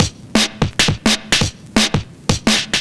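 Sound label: Drum and Bass Beat 170, drumnbass 170bpm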